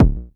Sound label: Tech bd, Jomox Airbase99